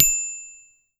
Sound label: Bell Chimes; Samuelgremaud